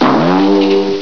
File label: BOOOING, CARTOON